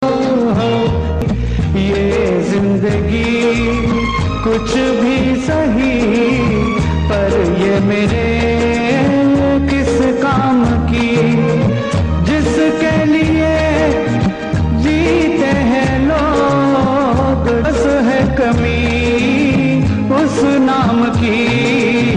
Romance

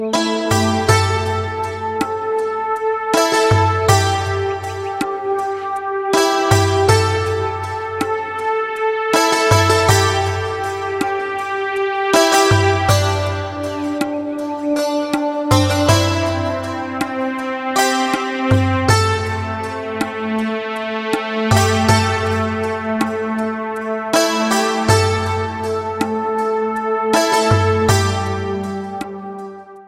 Smooth Sound; Htc

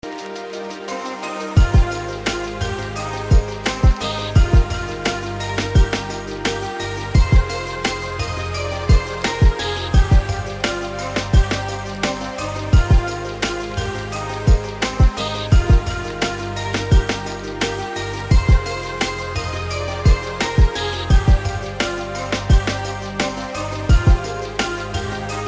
lofi; totoro

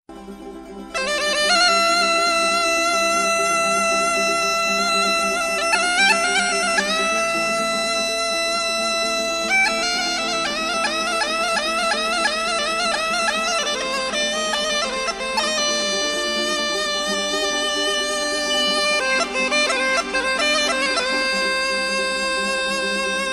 Gaida

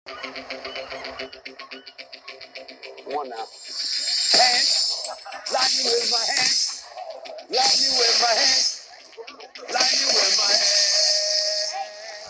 Shazam lightning

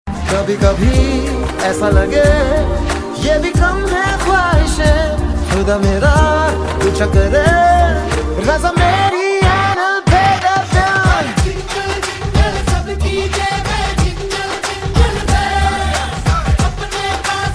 Jingle Jingle Loud

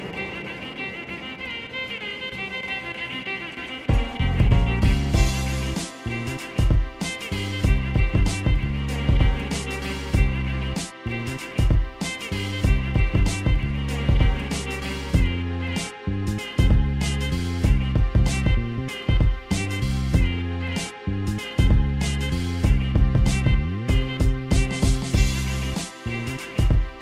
Sophisticate Violin

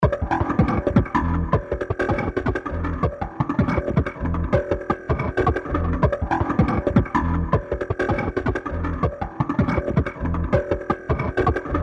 Robotic Alarm

